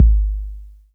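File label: bass drum 808 Tape Decay D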